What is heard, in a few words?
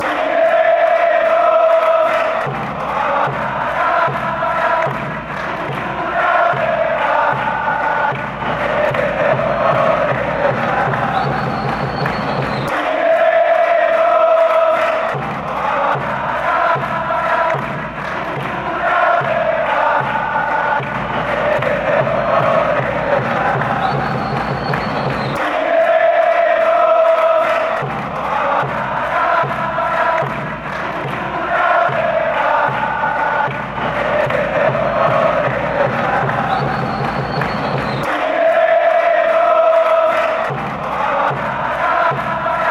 chile chant, fanchants